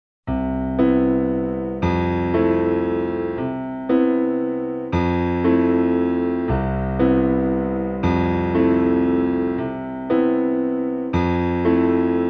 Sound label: cello